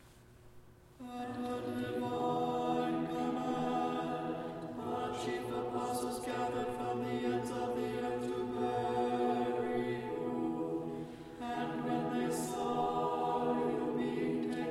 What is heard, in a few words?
Tone4 Common Chant Sticheron Example Tenor; chanting